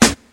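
raw snare b key, acoustic snare tuned to the B note; one shot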